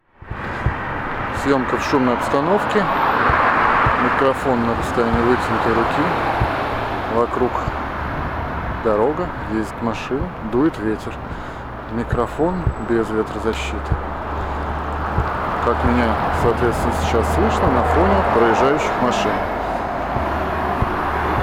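mke200 noise without protection hand, микрофон для камеры и смартфона; Sennheiser MKE 200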